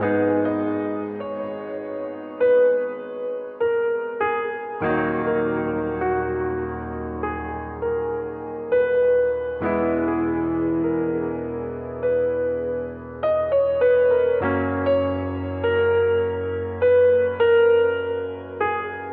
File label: piano and keys, sad loops